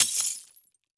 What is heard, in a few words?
Crash Glass Picture Frame Dropping On Concrete; crashes; AdobeCC